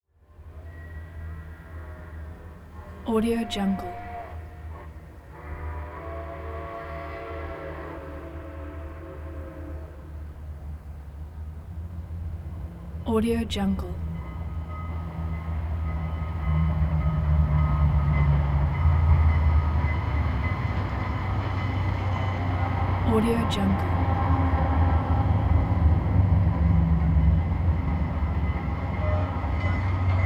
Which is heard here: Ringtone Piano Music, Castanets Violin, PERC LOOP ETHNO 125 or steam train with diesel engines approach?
steam train with diesel engines approach